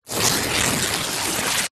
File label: paper wrapping thin rip; foley; zapsplat